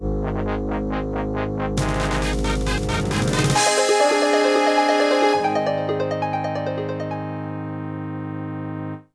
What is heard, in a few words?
midi; Wacky Wheels; apogee